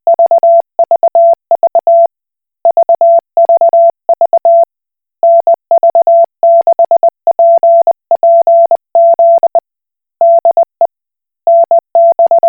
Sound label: Koch Morse